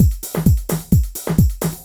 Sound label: beat